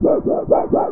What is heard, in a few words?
Sheet Wobble, Metal; Sonic Foundry (Sony Creative Software) - Ambient Atmospheres & Rhythms; Ambient-Metals